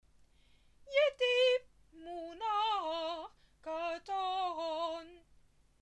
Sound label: yetiv clause; Torah